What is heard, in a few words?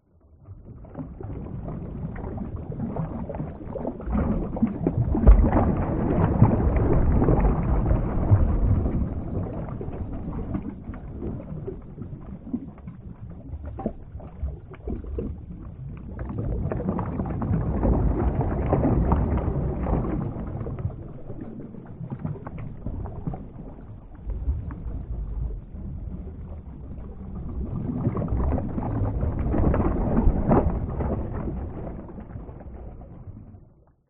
water underwater movement; nature